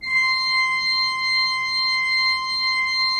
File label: 3D Pipe Mix Celeste, 3D Organs; E-MU Producer Series Vol. 5 – 3-D Audio Collection